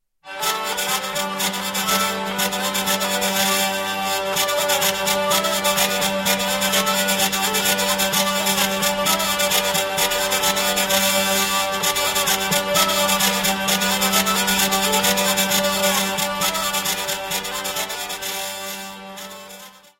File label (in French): Bourree Carree - France - Berry